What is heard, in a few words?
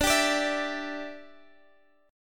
D Suspended 4th Add 9th D, chord